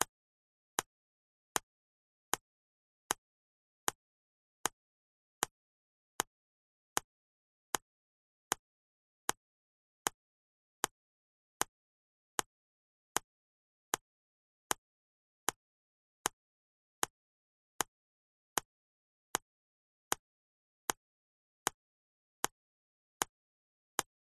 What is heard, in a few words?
nature water drips steady slow polystyrene